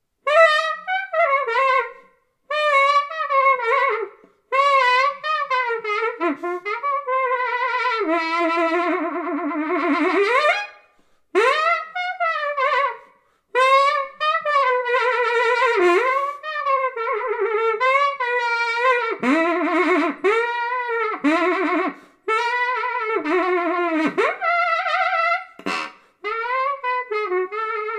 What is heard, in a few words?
SAX TOOTER C; Tootophone Sax and Trumpet